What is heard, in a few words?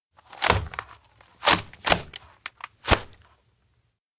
floor hit paper multi; anemoi